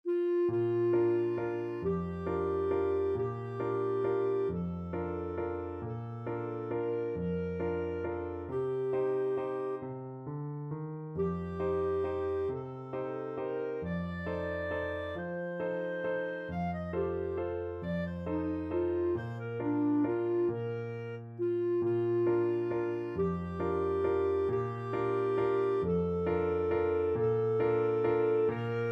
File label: gran vals, clarinet